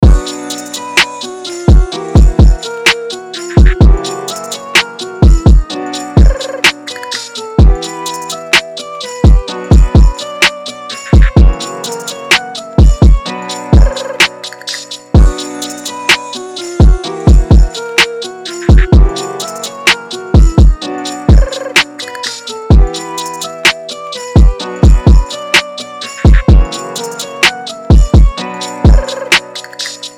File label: 127 BPM Bm; UNISON DEMO; Chavo